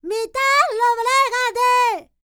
Vocal Planet World Voices, NOMADIC

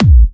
Trance Kicks, Bassdrums

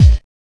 NU JACKER, KICKS; Zero-G; Funky Elements

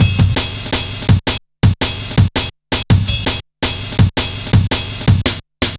Samurai, Drum Loops, 165.5, Jungle

